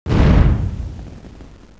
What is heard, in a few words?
blast